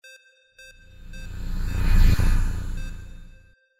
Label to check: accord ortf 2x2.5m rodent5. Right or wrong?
Wrong